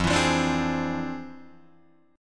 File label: F Minor Major 13th, Guitar, DADGAD